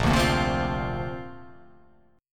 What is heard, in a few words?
A sharp Major Add 9th